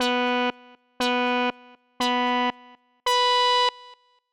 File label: count down